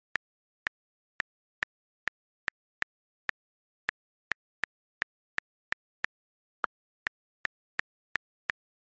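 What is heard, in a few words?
iphone keyboard sms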